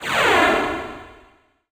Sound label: swoosh; Ulmos Adventure; pygame